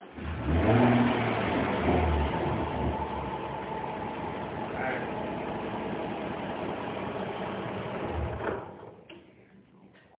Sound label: rattle more knock shut down